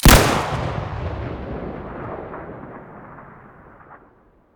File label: bm16 hole stereo, weapons